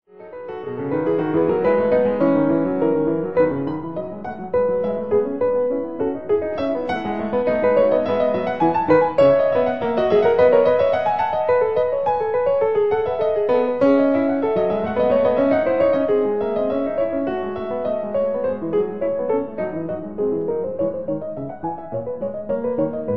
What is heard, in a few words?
E major Fugue; The Well-Tempered Clavier, Book I